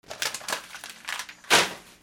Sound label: FRICTION